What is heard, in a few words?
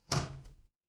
door open